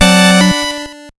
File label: Music Hesitation; gamerip; shovel knight king of cards